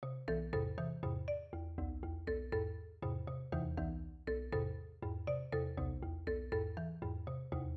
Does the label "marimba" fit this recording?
Yes